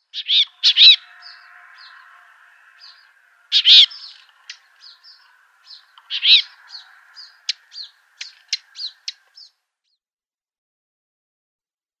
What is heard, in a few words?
Palestine Sunbird calls